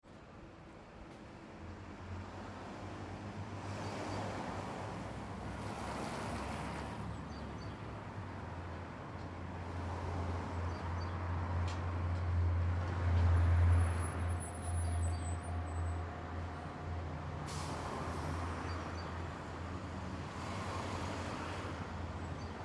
Traffic Light Spring Morning Birds, Medium distant recording of cars and buses passing; on light trafficed road. Some spring birds and high heel; Traffic And Car Sound Effects; Lynne Music